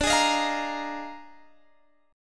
D sharp Major 7th Suspended 2nd Suspended 4th D, chord